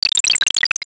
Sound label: bag rustle